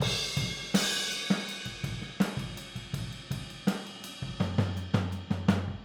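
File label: Boogaloo Fill Overhead stereo, 82 BPM; Sonic Foundry; Drums from the Big Room The Mixes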